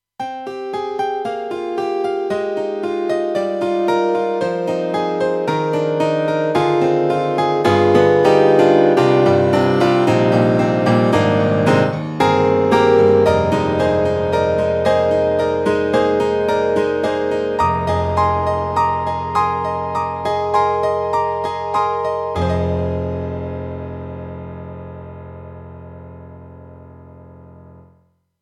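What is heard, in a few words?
YAMAHA TG300 Acoustic Piano 2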